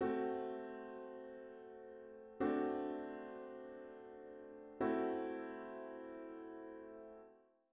Estudo de Harmonia acorde de sexta aumentada

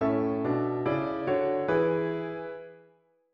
Coral Movimento simultâneo do notas de passagem em várias vozes; Bach

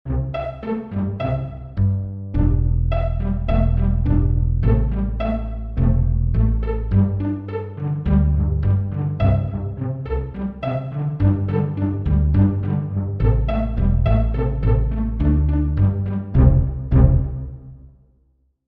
quarteto pizz cordas soltas

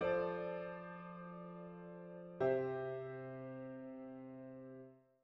Estudo de Harmonia acorde de subdominante com sexta acrescentada resolução na tônica

